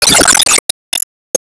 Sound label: Fence Delete; Armor Brake; gamerip; Enemy Tail2; Se Enemy Rose Queen Active